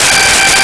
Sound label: Reed Organ G5; Nintendo; July 2020 Gigaleak